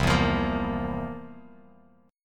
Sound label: C 11th, C, down, Bass Standard; C11 strummed